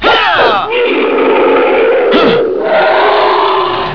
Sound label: grunts; Grunt & Roar!; POWER RANGERS